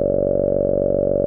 MOOG F1, Synthesizer; Hollywood Edge; Giorgio Moroder Rare Synthesizer Collection; MLTI.MOOG

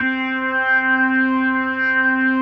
C4S, B3

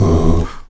VOX AHOO, Afro Chants, Stacc Ah Oos

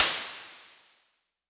Crashes; LIMAT Div Dept; Drums

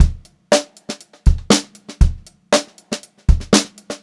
FUNKY, Funk Groove Drumloops; USB Soundscan vol.38; AKAI; 90 s Sample CDs

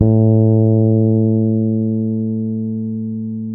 bass, A 3; Spectrasonics; Bass Legends CD 1

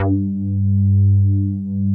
JX MOOG G3, MOOGY BS; Drums In Your FACE!

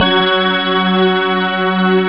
stringsgem; haunted; OCEAN; jazz jackrabbit 2

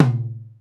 TOM, DrumBase5000; Crypton; CFZ KIT 2

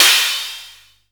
Fricke MFB712 HHOP

